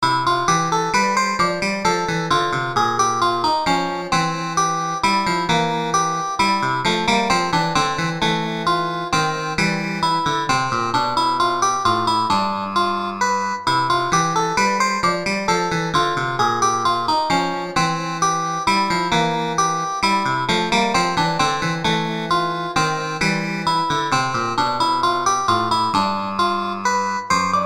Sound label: Menuet